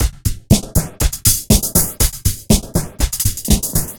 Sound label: Drama Drums (120BPM); musicradar